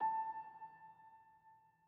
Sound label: Env Field Day Ground